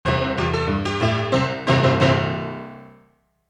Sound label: cha cha ender